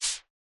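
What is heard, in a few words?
button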